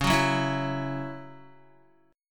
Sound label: C sharp Minor Double Flat 5th C sharp Guitar; C#mbb5; strummed; JGuitar